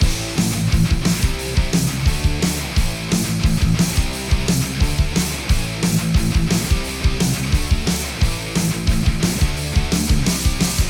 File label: Metal; Sans HHHusher